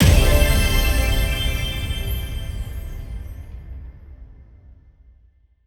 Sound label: bigwin end